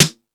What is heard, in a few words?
Beat Box; E-MU Pro-Cussion